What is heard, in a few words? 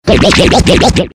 crazy smile ringtone